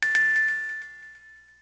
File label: startup; birthday-startup